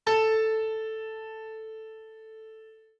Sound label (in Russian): la piano